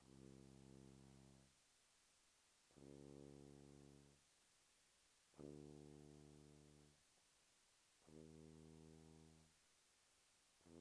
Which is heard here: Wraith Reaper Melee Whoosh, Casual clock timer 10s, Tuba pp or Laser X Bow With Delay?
Tuba pp